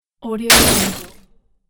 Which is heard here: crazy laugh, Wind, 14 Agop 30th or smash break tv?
smash break tv